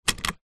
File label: car seatbelt buckle release toyota rav4; vehicles